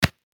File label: Hand pat on jacket; misc human